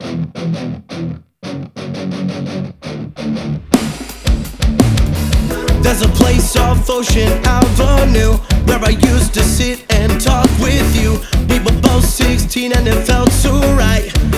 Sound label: Reggae Cover